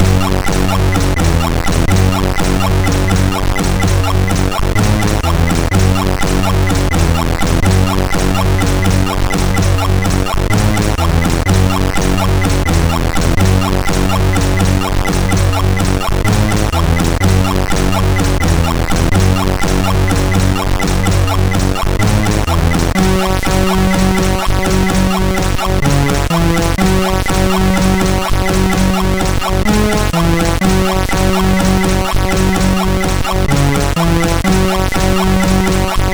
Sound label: Dog, MOS6581R4